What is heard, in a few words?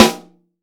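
piccolo snare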